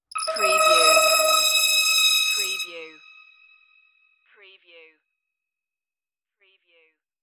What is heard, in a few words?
crystal interface beam